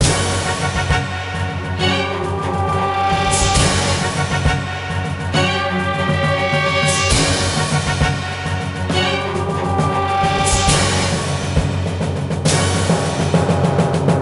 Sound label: King Battle; Lego Battles 2009 NDS